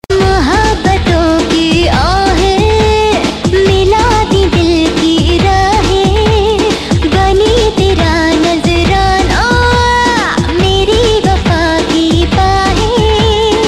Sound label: romantic sms tone; ringtones